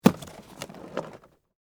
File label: Foley, Cardboard, Box, Pick, Up, Full; Cardboard (Sound Effects:Materials)